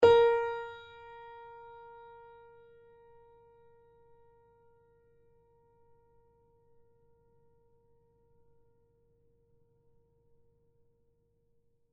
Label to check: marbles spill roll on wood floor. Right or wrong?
Wrong